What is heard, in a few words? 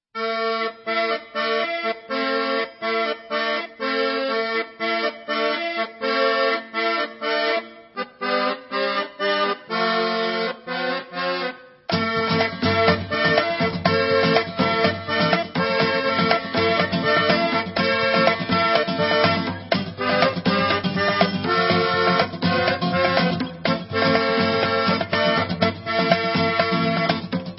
Instrumental